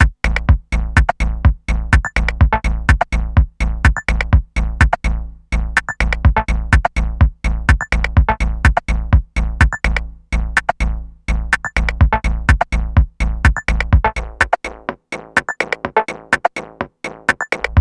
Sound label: Minimal